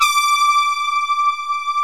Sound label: D6, SYN ANA5; Best Service Gigapack 1